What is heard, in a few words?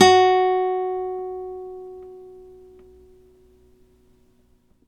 nylon F F#3, guitars; Synclavier Sampler Library